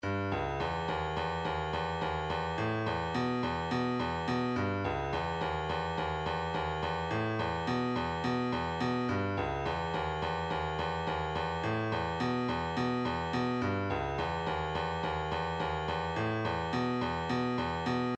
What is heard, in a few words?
hihat